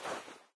clothes swipe; video games; player; taunt; source engine; tf

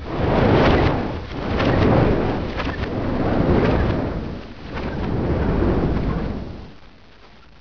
fan off; fans; Source Engine; bshift

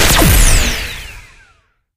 shooting star shoot charged, weapons; tf

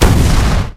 impact airblast; video games; source engine; tf

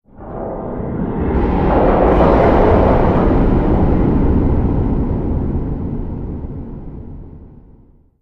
spacebase rumble; ambient; Source Engine; tf

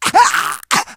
choke, survivor voice

